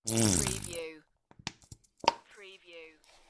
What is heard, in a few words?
Squeezy Honey Bottle; beanstalk audio